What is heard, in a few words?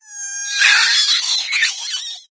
death, infected female